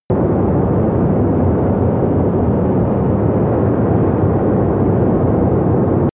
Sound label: missile large fly loop distant